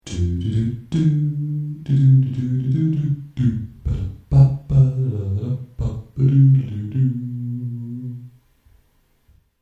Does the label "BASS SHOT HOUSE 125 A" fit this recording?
No